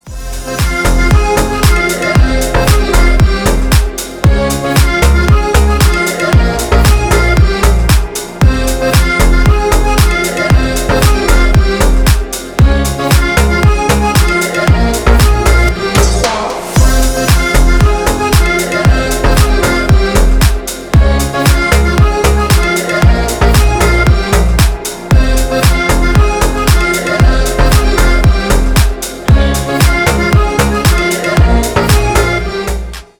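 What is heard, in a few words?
accordion; garmoshka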